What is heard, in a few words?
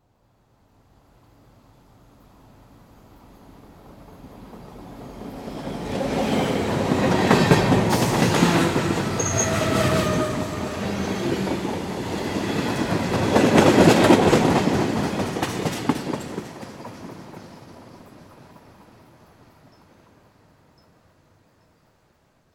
train movement